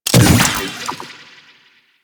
Shockwave Grapple Fire; Warframe; Venus; Venus Terra Shockwave Moa; Abilities

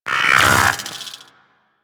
Vocal Idle; Warframe; Amalgam Moa

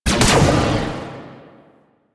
Shot, Spear Gun; BE Ferrox

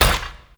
Melee Step; Warframe; Necramech

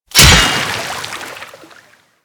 Axe Impact Water; Warframe; Weapons

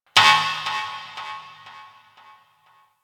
Ship Energy Knock; Ambience; Warframe; Corpus Ship Remaster

